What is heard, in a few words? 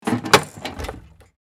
Ammunition box metal case open; Military Equipment